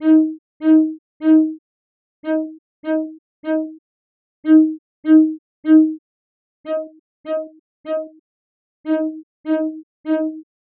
Violin vs Violin

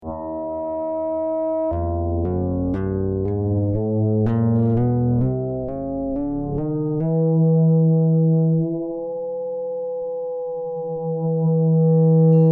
Sustained Ring Modulation; Physical Effects

